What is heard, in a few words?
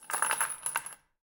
2x4 Chain; Scrap Weapons; Zomboid